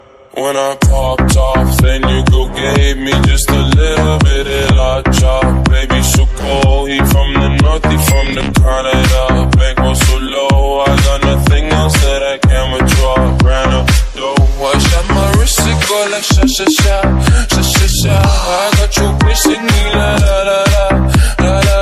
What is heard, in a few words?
sweet english ringtone; english ringtones